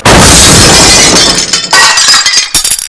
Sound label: glass; quake2